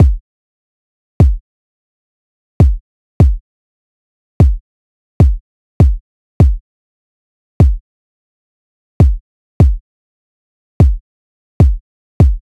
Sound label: Drum Loop - 150 BPM Kick; Loop Stems; Cymatics; DOPE DRUMS VOL. 1; Worlds